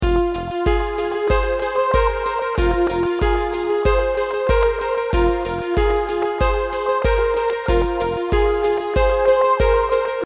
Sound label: music ringtone